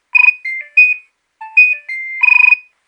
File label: nokia3100 ringtone